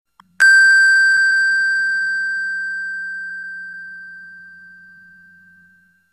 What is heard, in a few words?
3d Loud Msg Tone